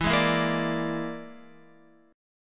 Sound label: E 7th Flat 5th, down, Guitar; E7b5; strummed